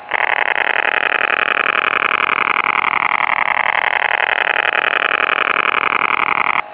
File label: SUPERDARN, SUPER DARN OTHR SYSTEM; SuperDARN NETWORK